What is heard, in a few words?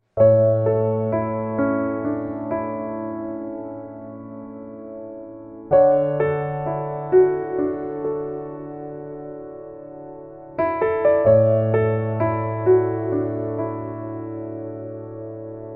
Contemplative Piano; China; OST; One Boat One World